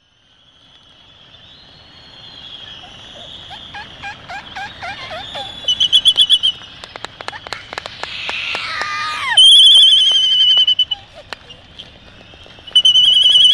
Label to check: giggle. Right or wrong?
Wrong